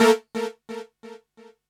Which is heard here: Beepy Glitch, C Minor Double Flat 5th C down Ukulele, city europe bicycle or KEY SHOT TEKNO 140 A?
KEY SHOT TEKNO 140 A